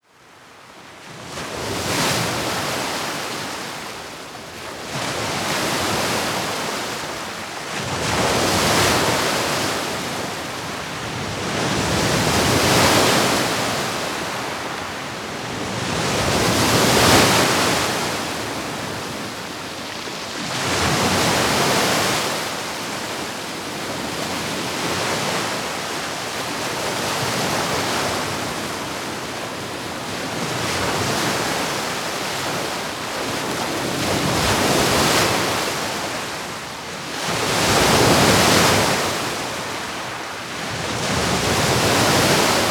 Big Sea Waves

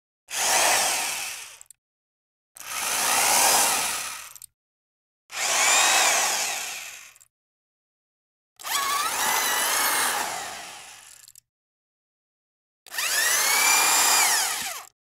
drill; tools

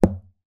dart

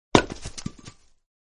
Axe Hit; Sound Effect

